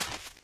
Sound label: dirt, footsteps, walk; player; source engine; left 4 dead 2; boomer